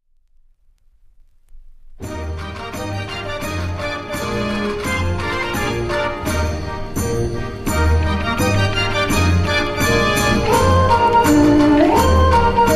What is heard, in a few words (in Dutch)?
draaiorgel; de gouden leeuw